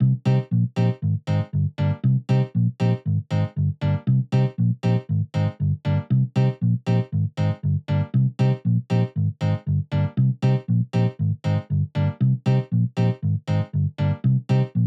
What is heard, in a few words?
electro swing guitar bass